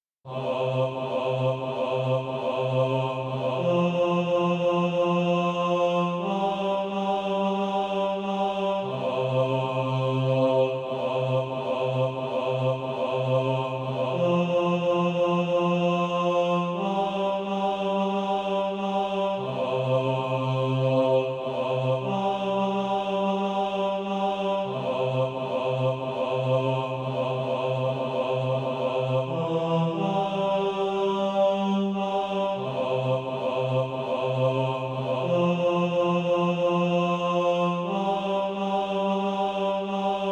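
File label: bass, SATB Practise Music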